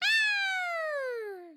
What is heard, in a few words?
koala howl